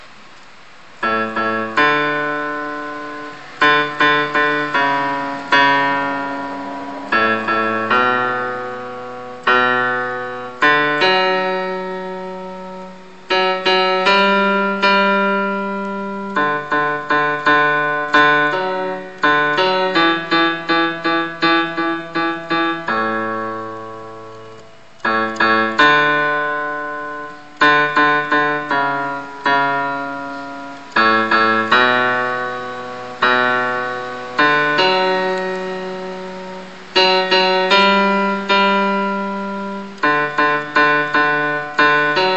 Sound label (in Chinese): 永遠的母親 Bass